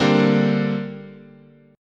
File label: D 7th Suspended 4th Sharp 5th, chord